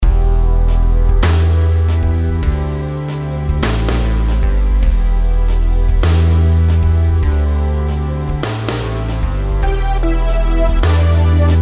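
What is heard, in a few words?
melodies compound 8s E minor; melody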